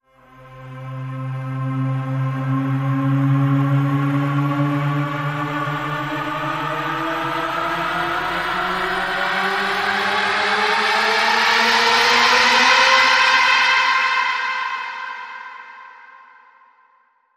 Inquisitive Slow Rise

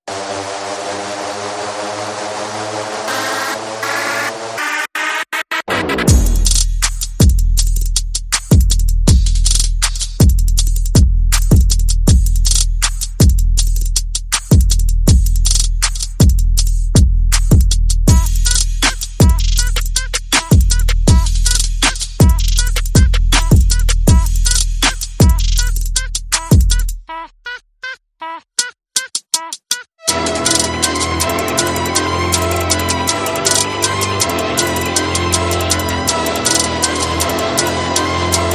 No Vocal

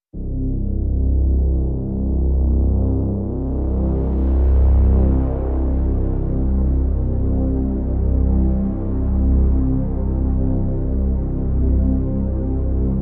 Drn Bottom Floor C

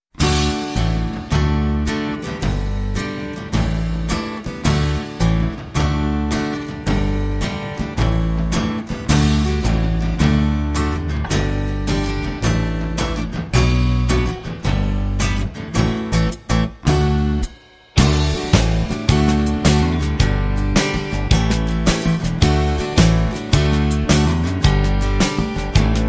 Power Gardening, Reduced

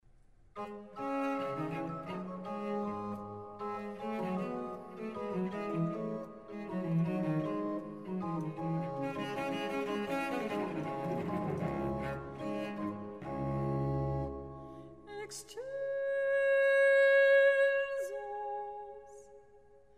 for Soprano solo; Laudate pueri Dominum; (Psalmus 112) RV600; Antonio Vivaldi

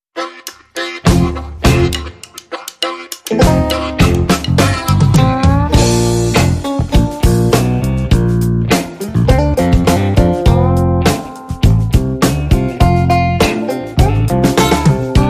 Blues

